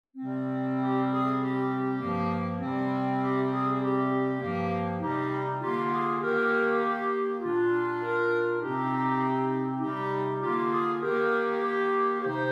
clarinet quartet

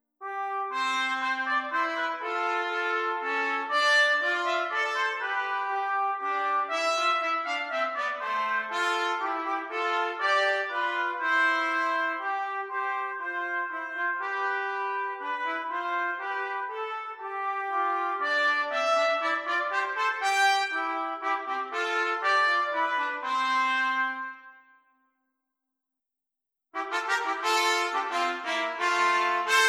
Trumpet DUET, trumpet horn duet; carols for two 15 carols

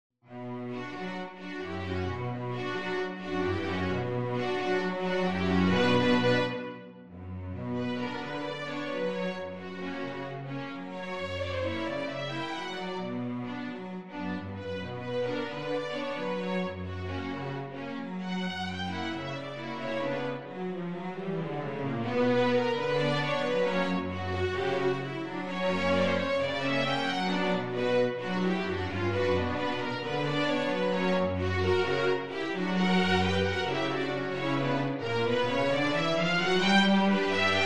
String quartet

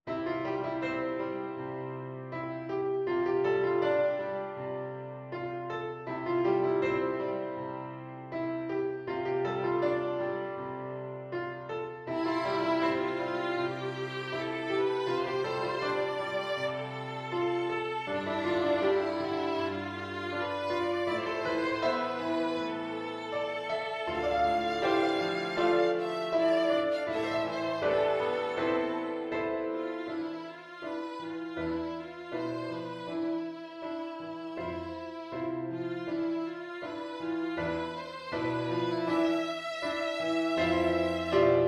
VIOLIN

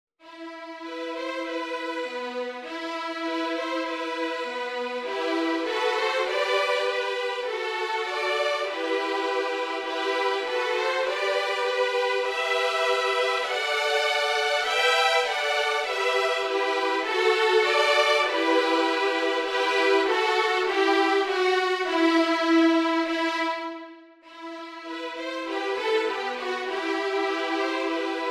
string trio, violin trio

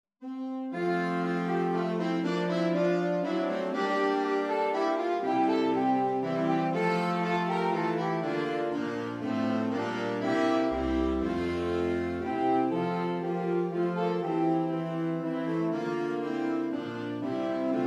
Sax Quartet; We Wish You a merry christmas

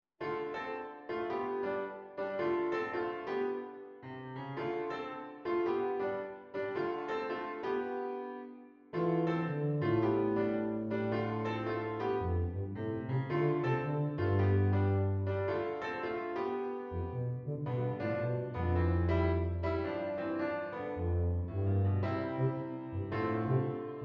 TUBA; eb bass or bb bass

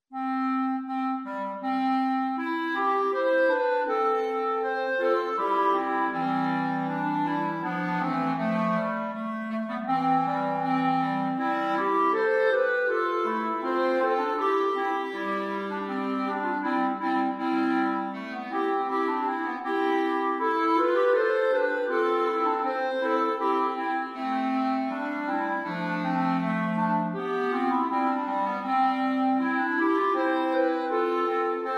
Clarinet Quartet

